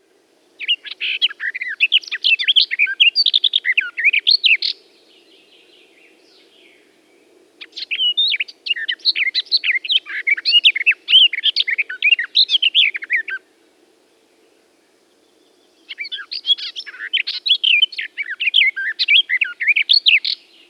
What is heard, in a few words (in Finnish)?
lehtokerttu; lehtimetsien lurittelijat